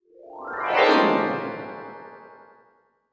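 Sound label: Music Transition Vibraphone; mallets vibraphone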